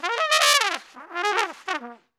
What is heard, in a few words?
TRUMPE, Trumpet FX; Roland - LA Composer vol. 2